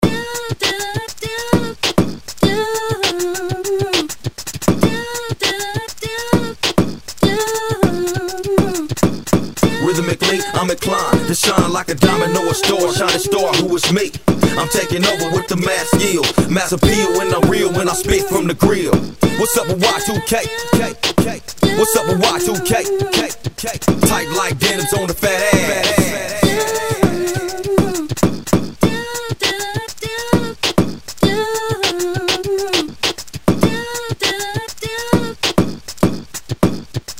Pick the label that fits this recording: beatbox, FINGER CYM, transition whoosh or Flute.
beatbox